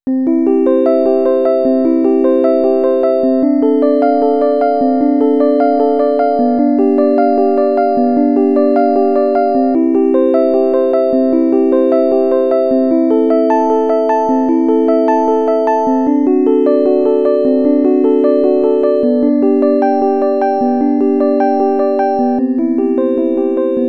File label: fender